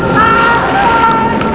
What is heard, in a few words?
SCREAM